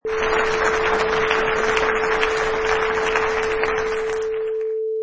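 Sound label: Large Crowd Applause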